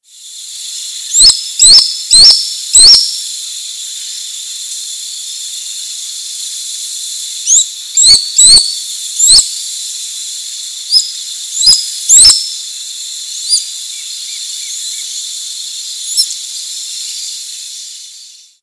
milano tijereta

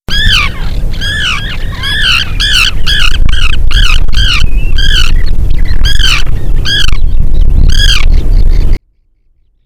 gaviotin golondrina; Sterna hirundo

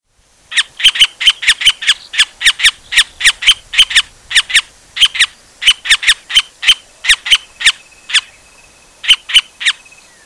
carpintero de los cardones

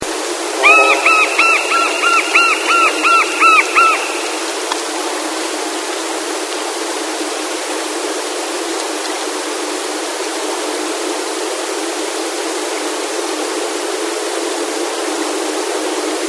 aguilucho de lomo rojo

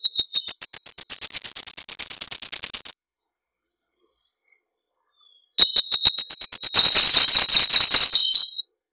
macunito

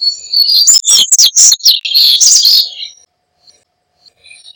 gargantillo

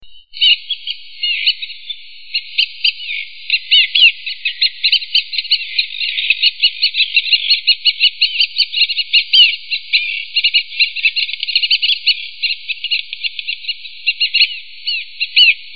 gaviotin antartico; Sterna vittata

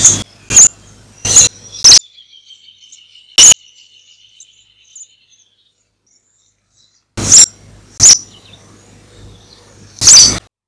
picabuey

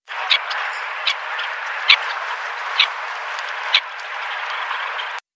falaropo pico fino

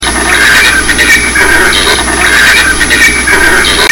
Phenicopterus chilensis - Flamenco

